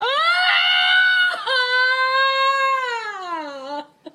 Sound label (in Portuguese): grito